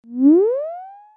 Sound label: Beam Deep